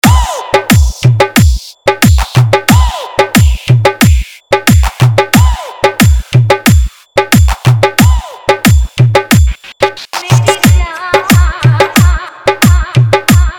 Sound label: Hindi Remix Song